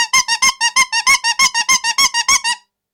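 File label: horn series of honks, clown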